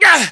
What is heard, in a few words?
melee attack